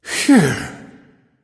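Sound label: non-verbal sigh; smite; voicepack; houyi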